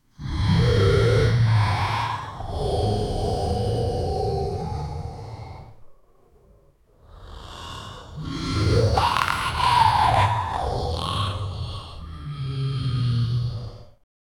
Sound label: beast angry; biomutant